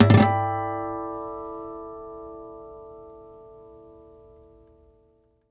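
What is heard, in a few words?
Nylon Guitar harmonics; E-MU Producer Series Vol. 8 – Platinum Phatt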